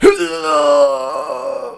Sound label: death; wizardwars